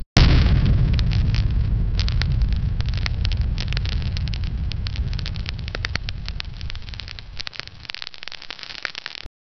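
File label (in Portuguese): salvo de canhões, energy weapons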